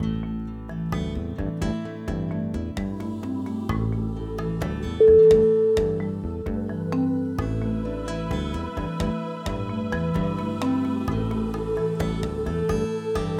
african safari

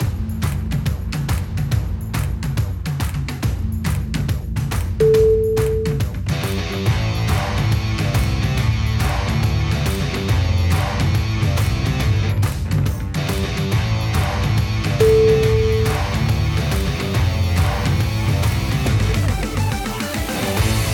hard rock actions

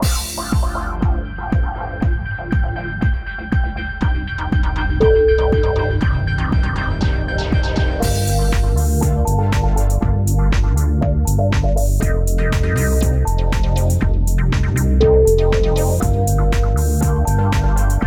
heart strings

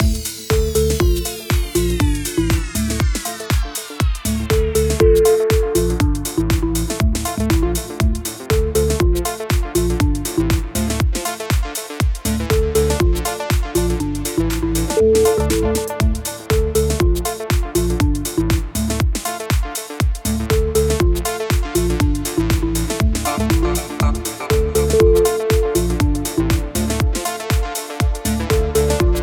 autumn house

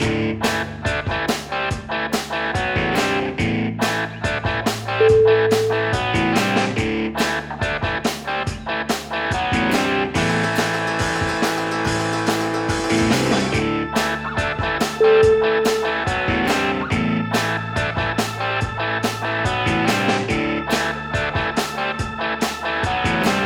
sixties rock anthem

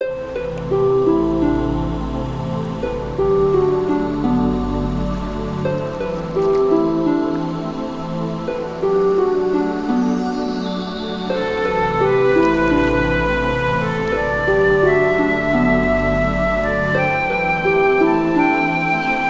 river meditation; melodyloops

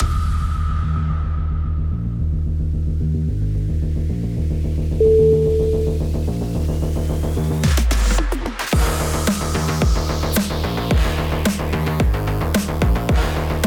playful groove

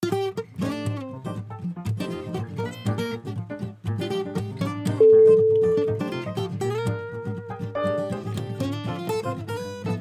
french jazz freestyle

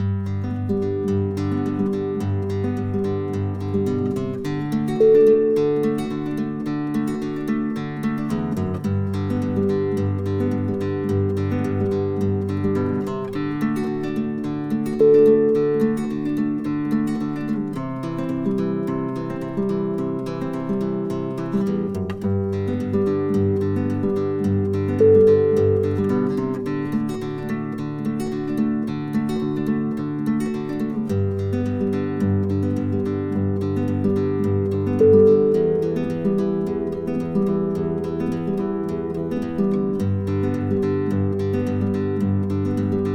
just fingerpicking

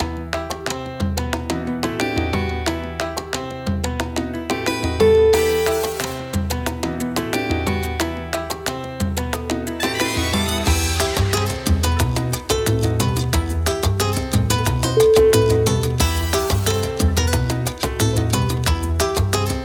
salsa

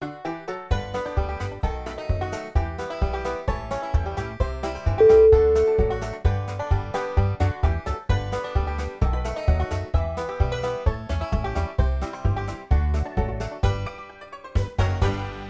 happy banjo theme

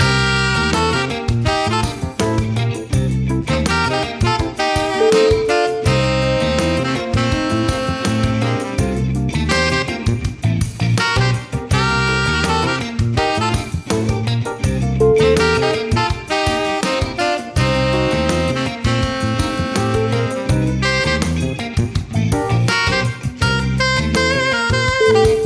downtown funk

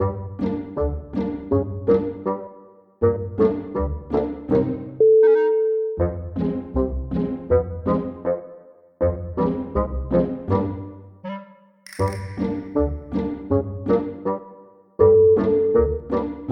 funny caricature

